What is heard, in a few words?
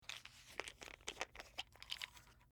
Pill Bottle, Unscrew Cap; Unscrew Child - Proof Cap From Plastic Prescription Pill Bottle; Bathroom; Household